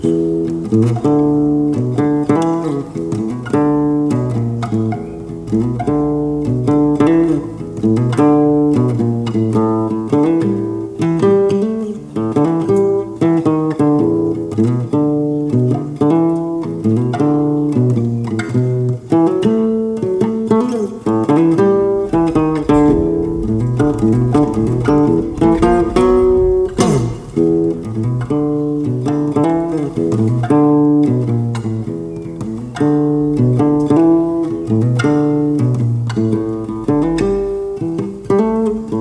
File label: Beach Blues